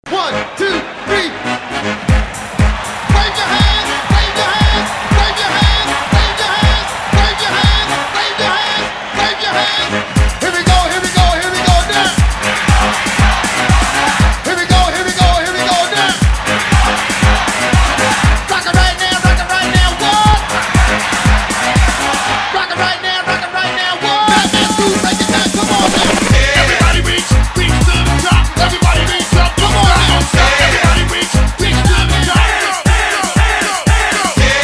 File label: Classic 90s Hype Party Rework